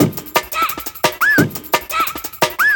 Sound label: DNB BREAK